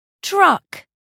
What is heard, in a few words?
truck